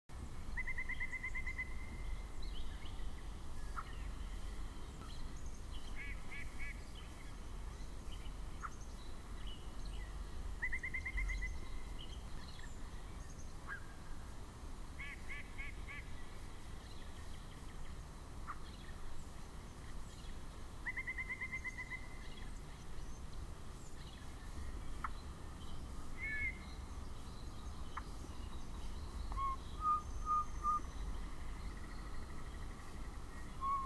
Yellow-breasted Chat; birds; Indian Springs; The Birds of Indian Springs; audio cassette tape

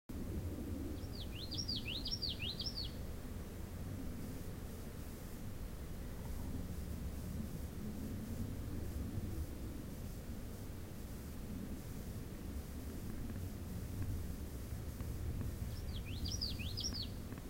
Common Yellowthroat; birds; Indian Springs; The Birds of Indian Springs; audio cassette tape

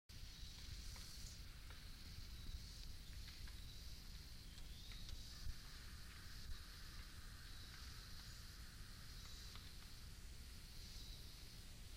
Pileated Woodpecker, birds; A Pileated Woodpecker hammers on a tree with his 3 inch beak. Later you can hear another one in the distance and they c; Indian Springs; The Birds of Indian Springs; audio cassette tape

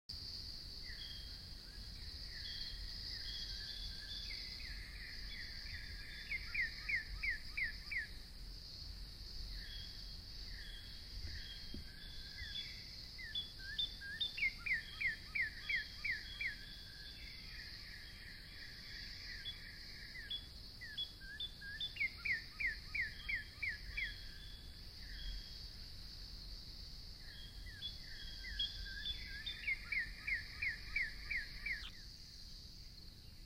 Northern Cardinal; birds; Indian Springs; The Birds of Indian Springs; audio cassette tape

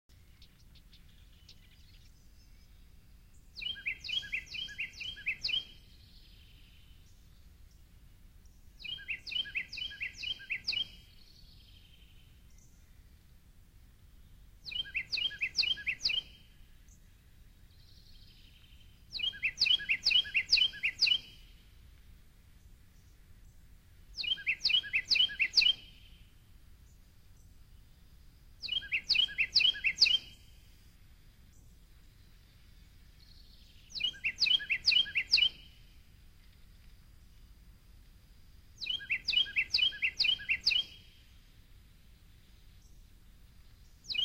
Carolina Wren; birds; Indian Springs; The Birds of Indian Springs; audio cassette tape

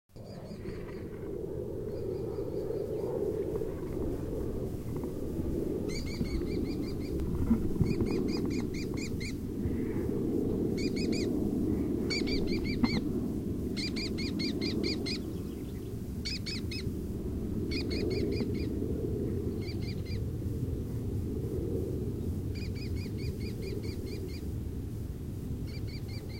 Red-Tailed Hawk, birds; An angry Red-Tailed Hawk hovering about 100 feet and repeatedly swooping down to attack me. You can hear the whoosh of; Indian Springs; audio cassette tape

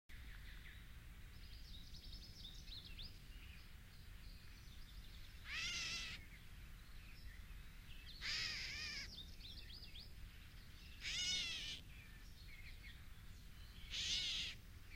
Gray Catbird, birds; Indian Springs; The Birds of Indian Springs; audio cassette tape

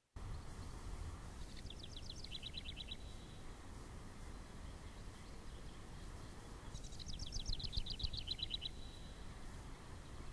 House Wren, birds; Indian Springs; The Birds of Indian Springs; audio cassette tape

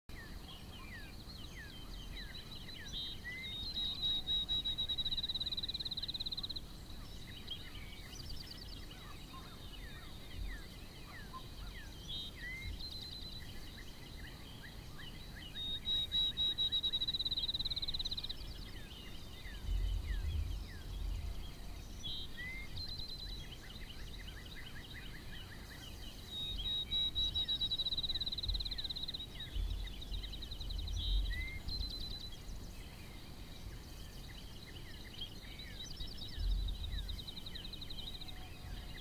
Field Sparrow, birds; Indian Springs; The Birds of Indian Springs; audio cassette tape

